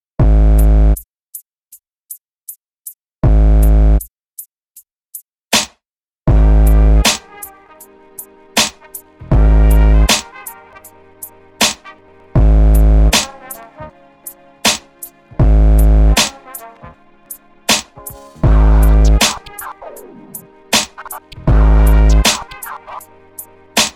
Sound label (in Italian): jazzeggiativo